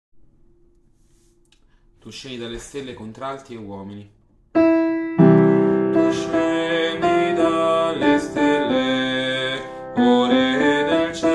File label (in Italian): Tu scendi dalle stelle Contralti e Uomini